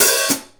Hi Hat Open; Essential Percussion

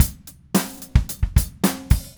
Red Maple Groove 110, DW Red Maple Kit

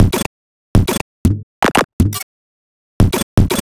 Robot Thunder, Modular Electronica

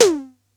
Tom Syn; Lost Tapes From Mars

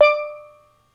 steel drum D3; ETHNIC DRUMS; Crypton - DrumBase5000

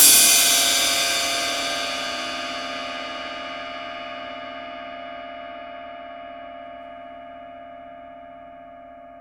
Cymbal Hit, Impact Drum Hits

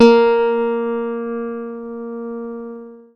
PLUCKED, Synclav FM, A#; Big Fish Audio

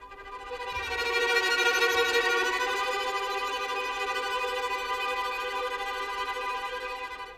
Violin; Sonic Foundry (Sony Creative Software); Orchestral VOL-2

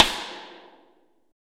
rim; Best Service; Real Mega Drums VOL-1

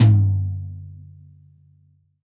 TD-20 Tom B, Roland TD-20 Toms

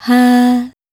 "Hah" B2, Swingin' Single Notes

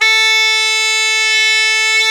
CHANTER A3; World Combo

